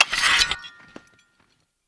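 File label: draw, butterfly knife; weapons; knife bowie; cstrike; csgo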